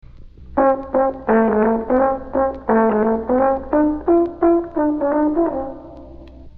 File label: euphonium